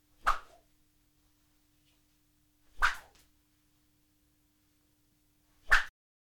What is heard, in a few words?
Whipping